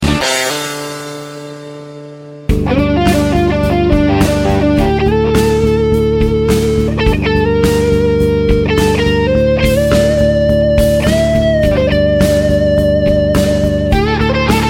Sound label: solo rock